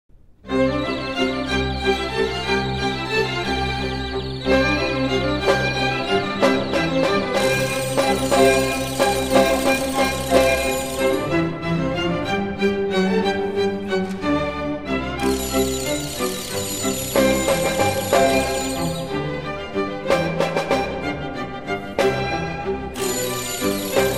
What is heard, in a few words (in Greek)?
Η συμφωνία των παιχνιδιών